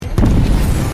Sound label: jump boost; iron man